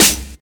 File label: dirty snare drum sample c key